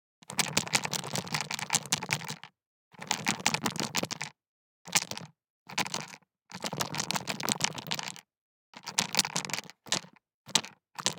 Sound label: DICE in Leather Cup, Shake, 4 Four Dice; Samples; ArticulatedSounds; DICE Sfx Party (Extended)